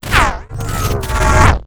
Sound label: wooshes transform sweetener